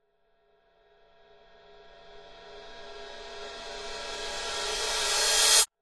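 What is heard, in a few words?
Cymbal Reverse Approach Heavy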